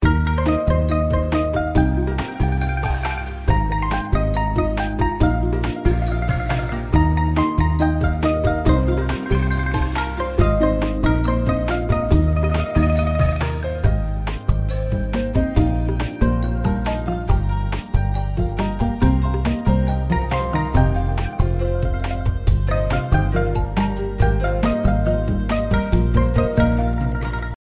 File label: bg music